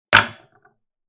包丁を強めに置く音

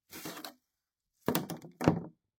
スリッパを手に取って置く音; slipper

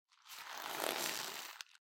革の手袋の音

